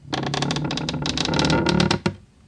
camp, ambience

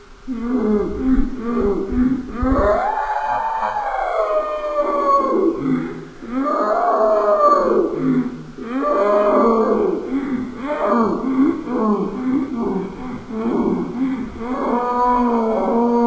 child cryin

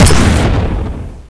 rail fire, weapons

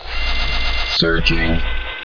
gunner idle

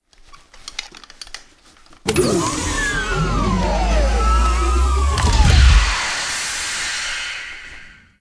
tau draw admire; Zshiryu777

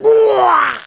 pain

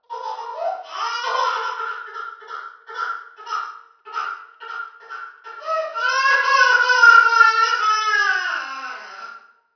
baby cry

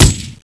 saw fire, weapons

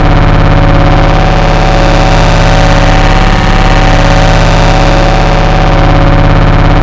tele attack

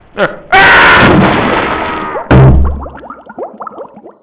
scream

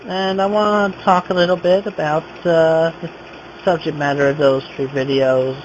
alert

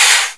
grill, weapons

